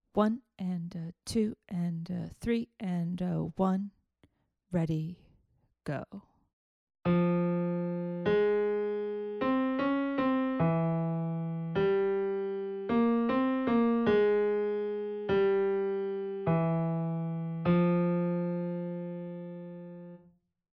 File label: melodic dictation